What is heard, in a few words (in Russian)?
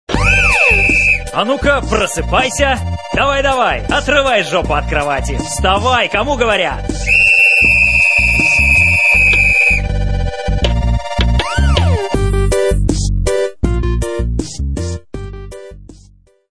Budilnik